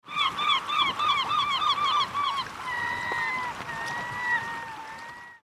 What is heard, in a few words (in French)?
mouettes